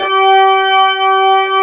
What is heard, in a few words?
ROCK B3 D, HAMMONDS; East West; Global Sounds